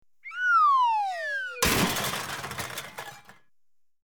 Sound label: Cartoon -Slide Whistle Down And Crash; Slide Whistle Glissando Down To Small Metal And Plastic Crash; Boings